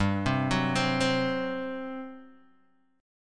G Major 7th Suspended 4th, G, down, Guitar, Open D